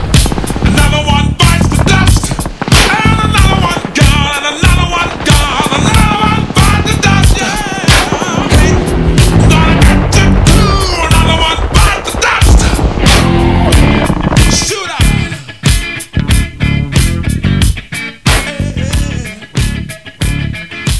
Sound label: step